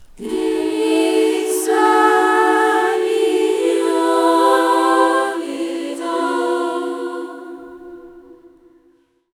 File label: nepali folk vocals sung